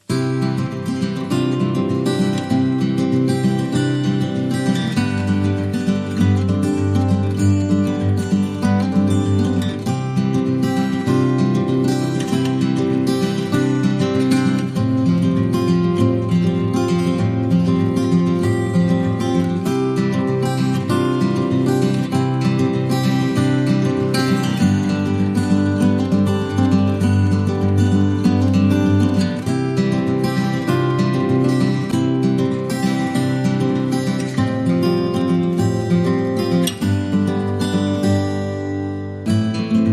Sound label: Easy Guitar